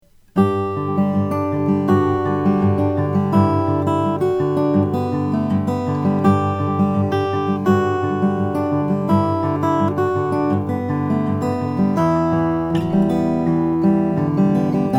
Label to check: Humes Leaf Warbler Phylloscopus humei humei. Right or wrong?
Wrong